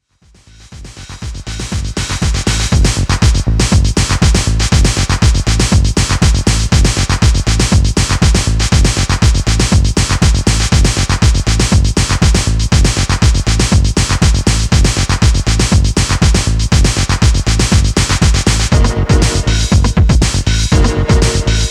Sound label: Acid Chain